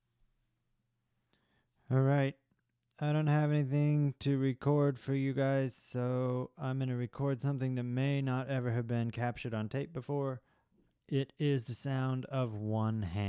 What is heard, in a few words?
Clapping